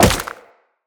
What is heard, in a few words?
Tenno Wall Jump Ice, footsteps, wall run; Characters